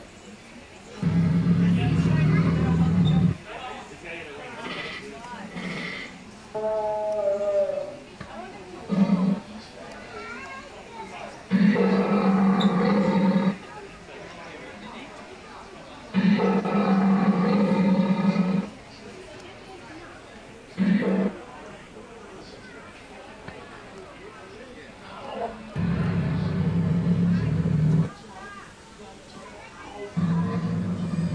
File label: tigera roaring at singapore zoo